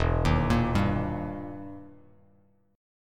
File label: E Minor Major 7th Flat 5th, E, down, Bass Standard; EmM7b5 arpeggiated